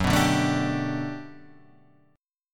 G Major 9th, F sharp

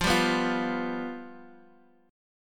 F Suspended 2nd Flat 5th, Guitar, Open D

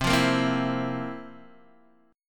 F Major 7th Suspended 4th C

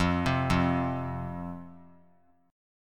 F 5th F, down, chord; F5 arpeggiated

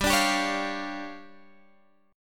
D Minor Major 7th Double Flat 5th G, guitar

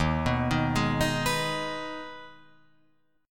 D sharp Suspended 4th Sharp 5th, Guitar, Open F; arpeggiated

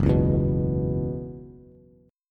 F sharp 7th Suspended 4th Bass, chord; F#7sus4 strummed

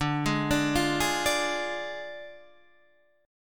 D Diminished D

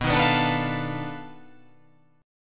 B 7th Sharp 9th Flat 5th, down

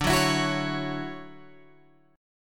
D-Major 9th D down Guitar Open G

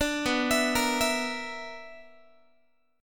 B-Minor Major 11th, Guitar; BmM11 arpeggiated

